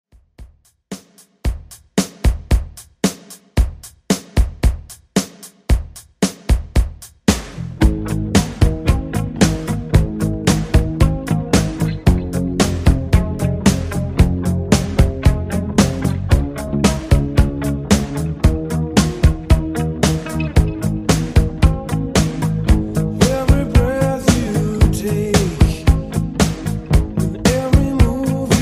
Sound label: 80s Redrum, 113 Bpm